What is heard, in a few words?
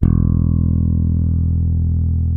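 Spector bass E0 hard; Northstar - New Gold VOL-1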